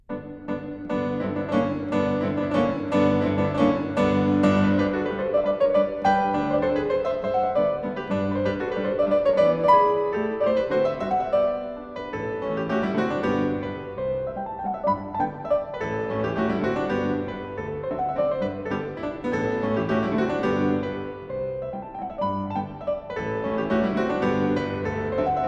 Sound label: Mazurkas, Piano; 4 Mazurkas, Op. 67; The Piano Works, Disc 10